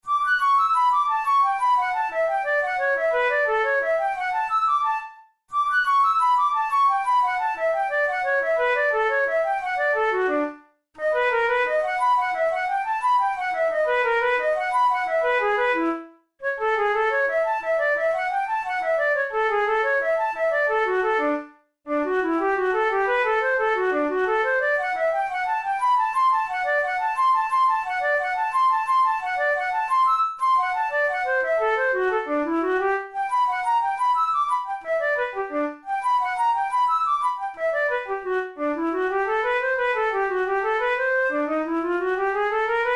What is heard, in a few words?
study in D major